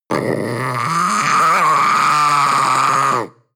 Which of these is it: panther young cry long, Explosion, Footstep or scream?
panther young cry long